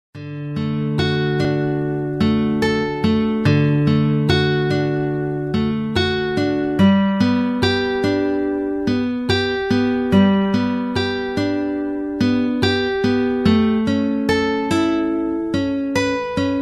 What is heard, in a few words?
hawaiian guitar